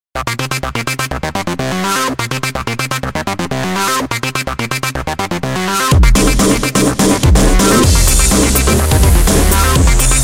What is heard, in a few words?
meltdown